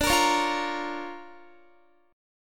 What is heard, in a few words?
D Minor Major 7th Sharp 5th, D, Guitar; DmM7#5; strummed